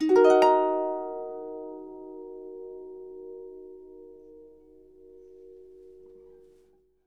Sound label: D#, Percussion & Harp; Miroslav Vitous